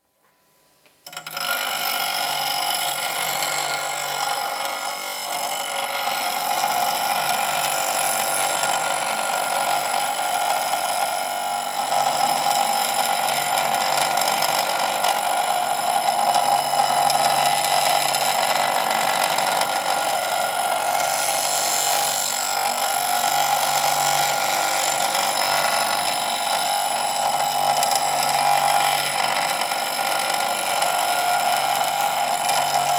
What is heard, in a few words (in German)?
Schleifbock